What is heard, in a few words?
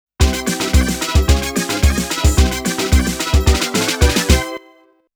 Opening Fanfare; Namco Classic Collection Vol.2; arcade soundtrack